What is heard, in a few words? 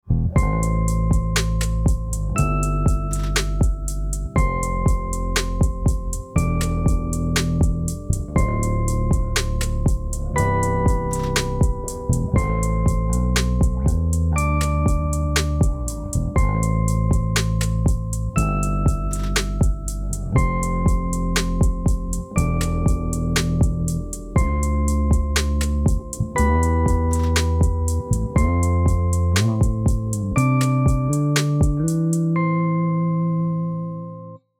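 Tone Fretless, bass; Yamaha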